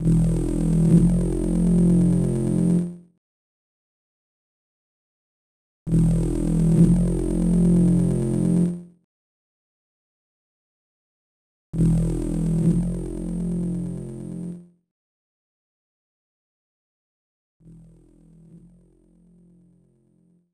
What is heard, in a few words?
Swamp Creature Appears; Space Quest 2; Apple